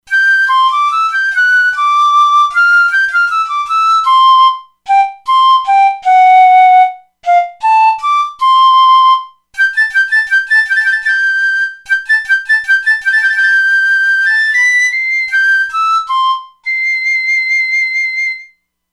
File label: Piccolo, E-mu Proteus-2; Factory Presets